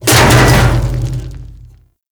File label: Storage Container Smash; Objects; Grineer; Warframe